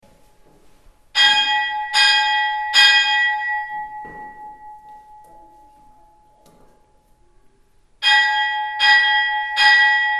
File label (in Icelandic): modrudalskirkja staerri, kirkjuklukkur